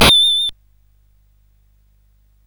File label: sid CSG8580R5